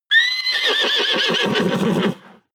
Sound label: horse neigh agitated; natur-tiere; pferde